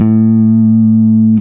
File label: bass 4 (A); Sonic Foundry (Sony Creative Software); Ugly Remnants VOL-2; Basstuff